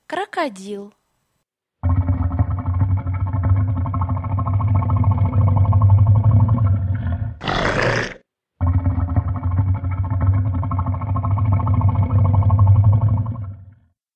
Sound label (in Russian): Крокодил